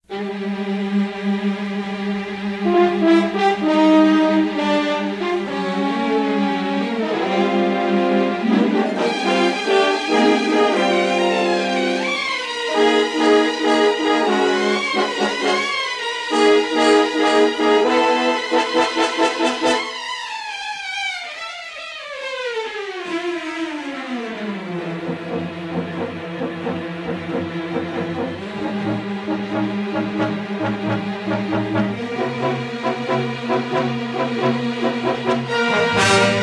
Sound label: Chase medium